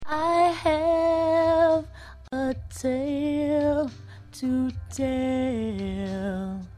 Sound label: Acapella